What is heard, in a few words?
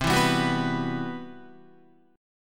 C Major Sharp 11th, Guitar, CM#11 chord; CM#11 strummed; JGuitar